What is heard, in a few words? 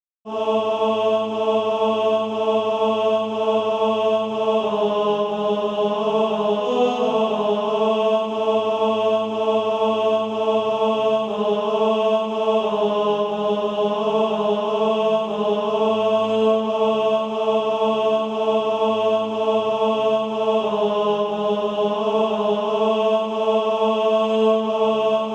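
tenor, SATB Practise Music; Christ Is Waiting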